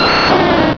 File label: cry ivysaur; pokeruby; direct sound samples